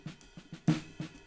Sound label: Kit